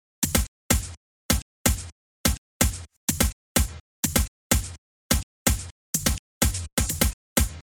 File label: Tom